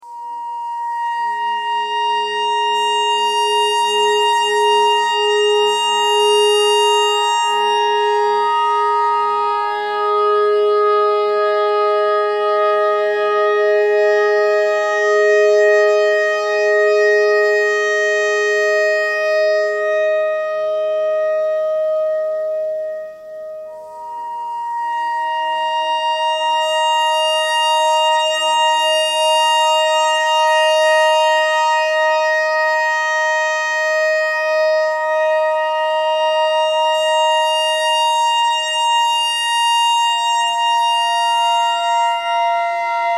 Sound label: clarinet notes e clarinet notes e pentatonic